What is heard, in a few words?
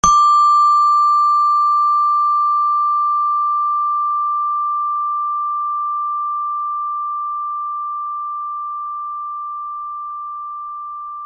space bar saturn